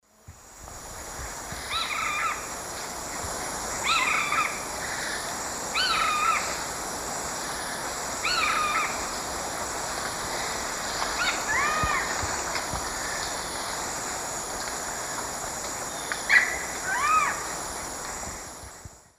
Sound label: Piaya melanogaster, calls; S. America; Eastern Lowlands